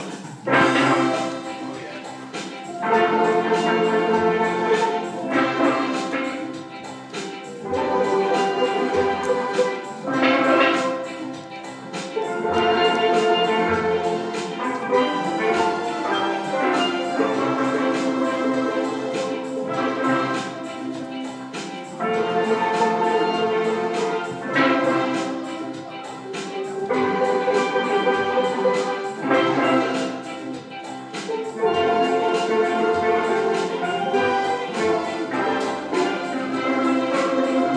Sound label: steel band salsa